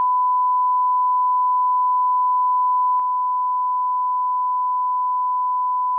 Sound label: soundproof effect 1000hz; 遮音(防音)シートの防音性能; 隣室からの音が「くぐもった」感じになります; 防音効果(低減値)です